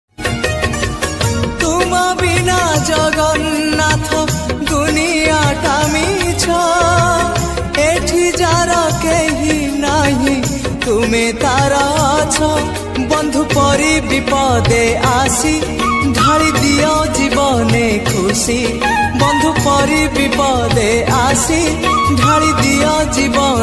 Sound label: odia jagannath bhajan; jagannath status